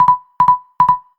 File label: Recurring Alert; GPSMAP H1